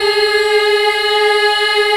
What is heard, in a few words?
G#3 child eh, Choir; USB Soundscan vol.28